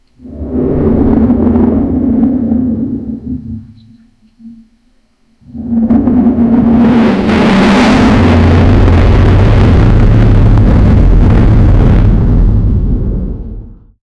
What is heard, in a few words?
wyvern dragon was heavy